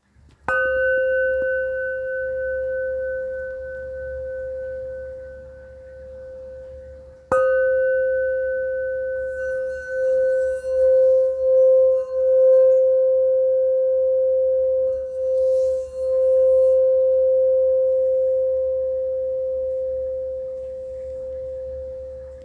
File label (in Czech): bohyně, tibetská zdobená mísa; 548g; tara